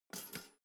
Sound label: object kitchen toaster pull up soft